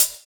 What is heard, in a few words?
Hhat, HiHat; Equipped Music; Loungin House